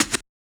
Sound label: SCR, Yamaha DJX 2B